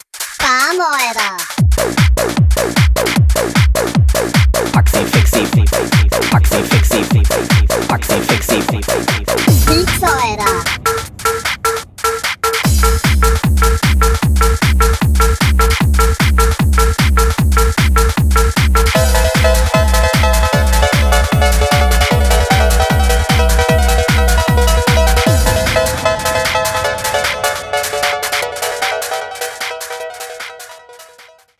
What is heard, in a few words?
hardtechno pitched version